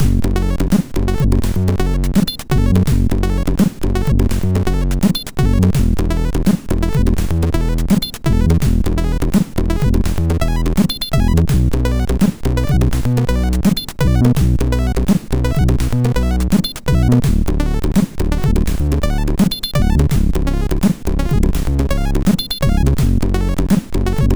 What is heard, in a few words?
Two voice Test, MOS6581R4